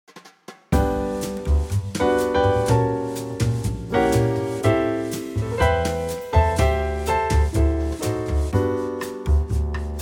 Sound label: G trio bossa